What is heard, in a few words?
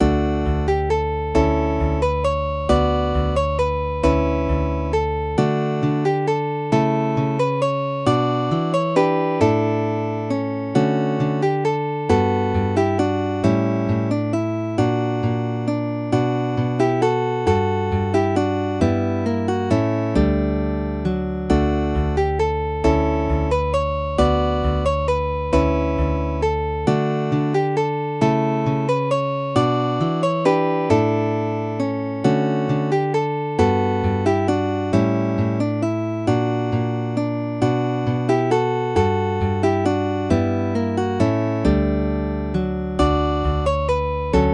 traditional spanish, guitar; el noi de la mare